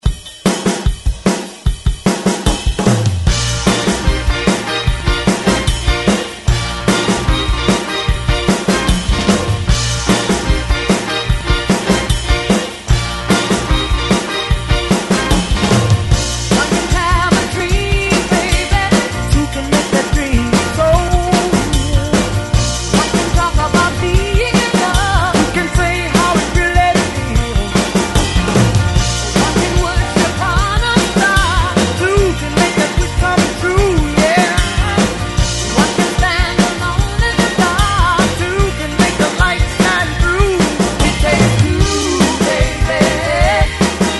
ReDrum 150 Bpm

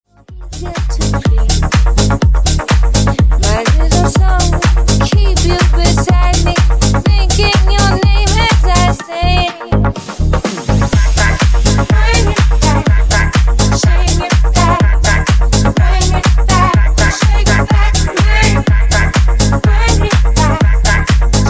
Disko Funk Remix 124 bpm

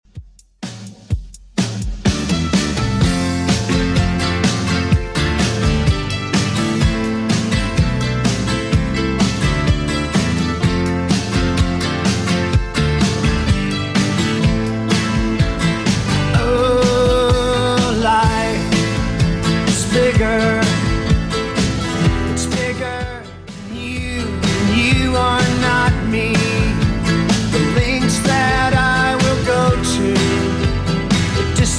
90s Rock Redrum 126bpm

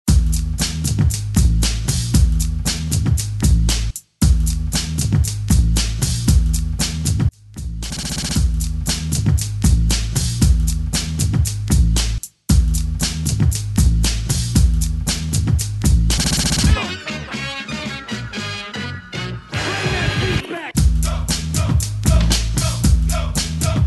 Hyped Intro Outro 116 bpm

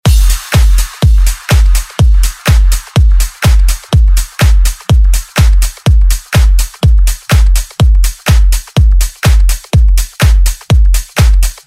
Pop Dance Redrum

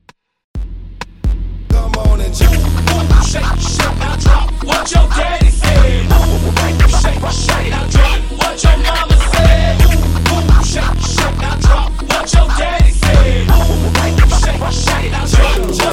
Re Drum Clean 130bpm

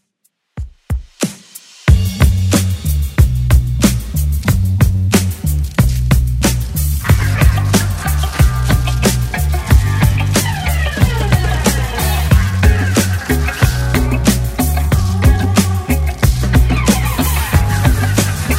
80s rock redrum 92 bpm

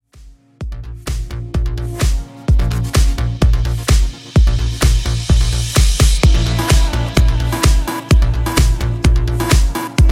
Deep House 128 Bpm

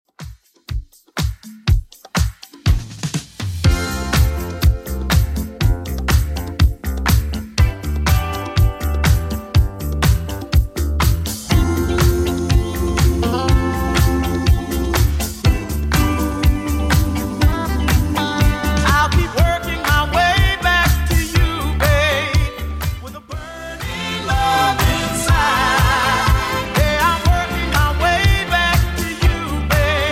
70s Redrum 122 bpm

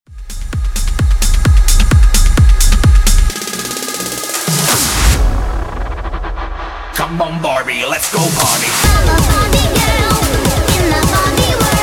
edm breaker 130 bpm

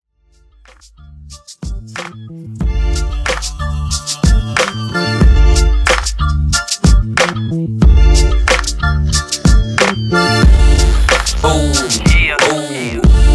Intro 92Bpm

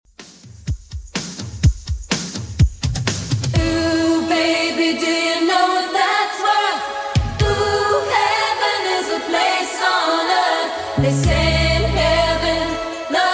80s Redrum 125bpm CLEAN

